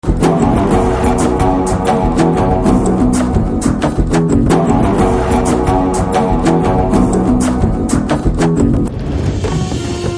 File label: Trailer BGM Theme Ringtone; Bheemla Nayak